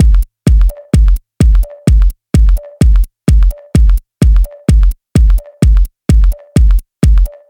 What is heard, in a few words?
clicky tech kick sample; drum loops